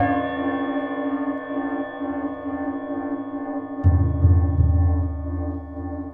ZERO GRAVITY BEATS

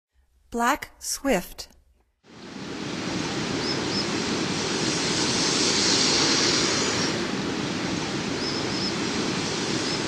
Black Swift, Songs of Birds of North America; Stokes

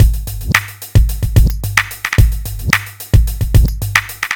r n b kit 110h

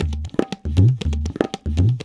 tabla 120

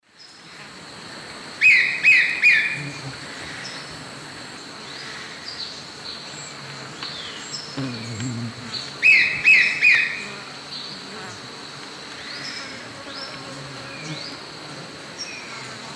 Cnipodectes subbrunneus, call; S. America; Eastern Lowlands

